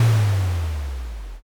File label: earth magic